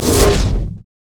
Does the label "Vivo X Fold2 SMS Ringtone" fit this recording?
No